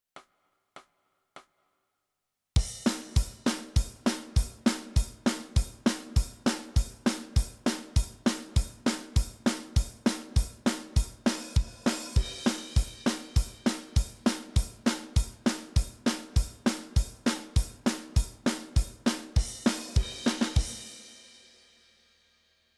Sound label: Drums